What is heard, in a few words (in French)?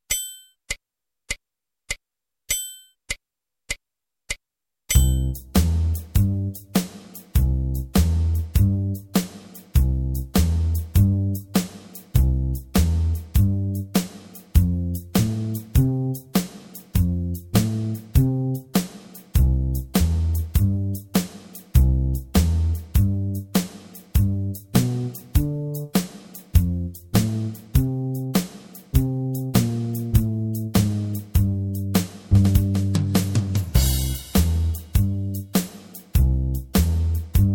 bande orchestre boogie